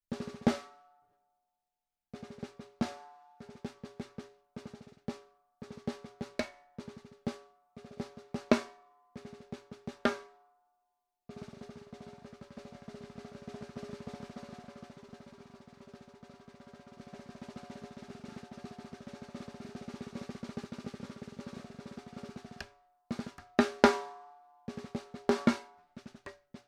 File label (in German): PDP Snare Klein Hohe Stimmung